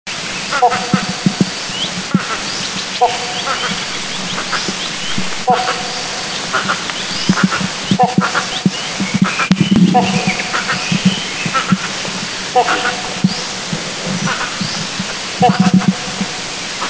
Great Hornbill, Kaeng Krachan national park in Thailand